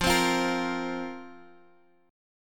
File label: F Suspended 4th F down